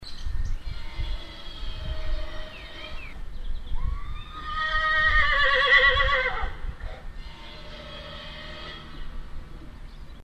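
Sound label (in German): Pferd und Säge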